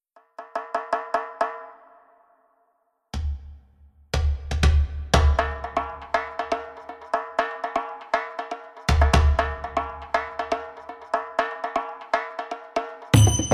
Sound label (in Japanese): ベーリー・ダンス only bass